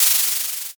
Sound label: scroll open